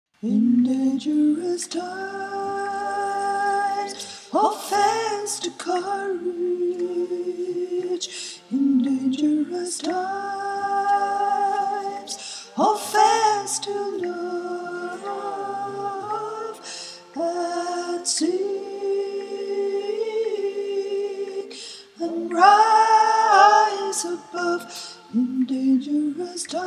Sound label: chorus sop